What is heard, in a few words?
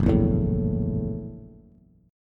F sharp 7th Sharp 9th, down, Bass; strummed; JGuitar